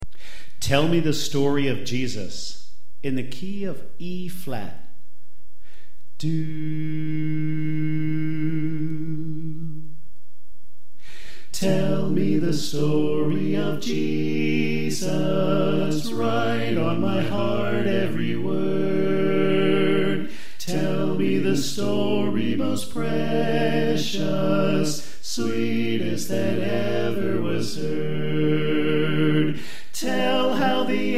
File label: Alto Left